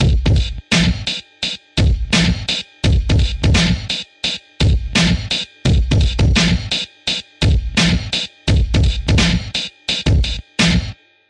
dumping swing crunch, drum loops and breaks, 085 bpm